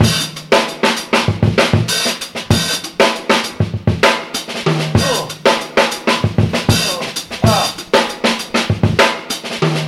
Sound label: ooh ahh, Drum loops, Funky